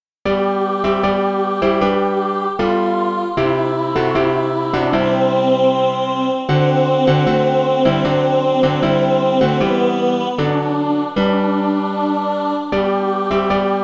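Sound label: alto, Practise Music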